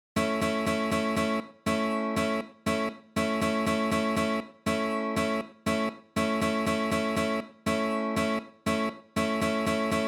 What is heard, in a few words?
Rhythm, guitar